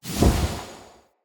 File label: Staff Caster Cast Short, Weapons; Player; Staves; Galene